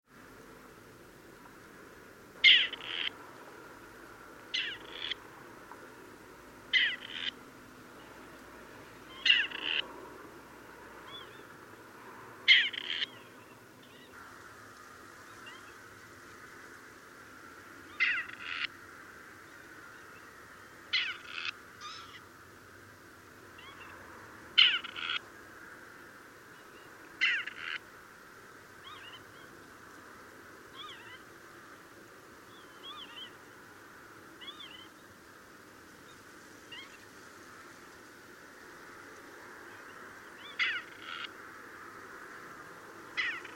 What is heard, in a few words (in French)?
perdrix grise